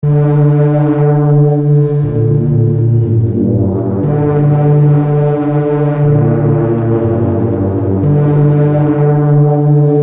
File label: Bass Deep Hot Water PAD; Heavyocity Mosaic; Macro Modulation